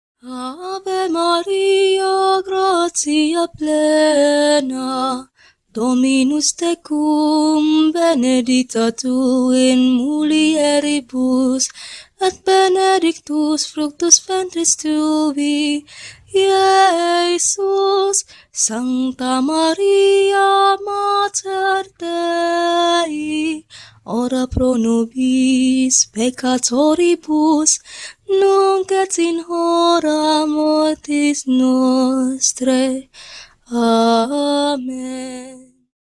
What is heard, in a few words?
Ave Maria (Procession Pilgrimage Chant)